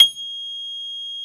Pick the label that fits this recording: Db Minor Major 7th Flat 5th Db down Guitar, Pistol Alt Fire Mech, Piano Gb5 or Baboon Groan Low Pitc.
Piano Gb5